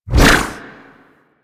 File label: Melee Long Whoosh; Warframe; Weapons